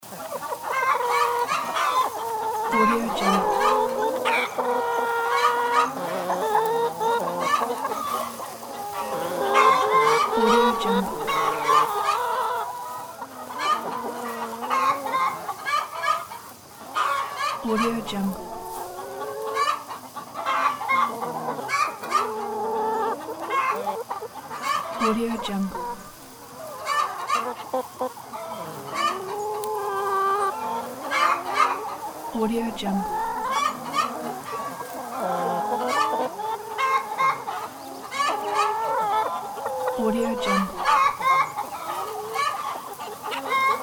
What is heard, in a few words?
chicken coop nervous clucking loop